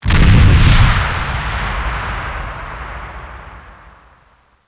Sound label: impact, Bridge Impacts; sfx